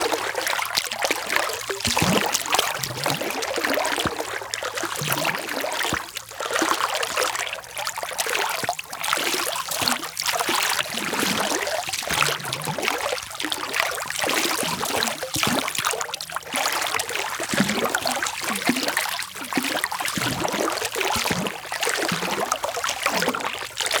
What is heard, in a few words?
water movement loop; Rust